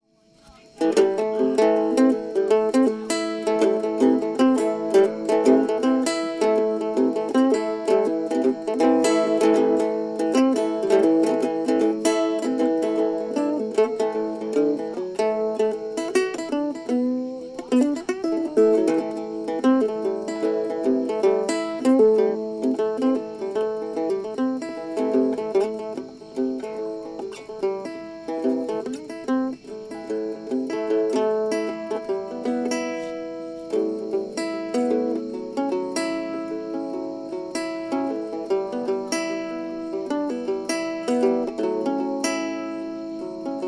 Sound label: Bluesy Mando Gurdy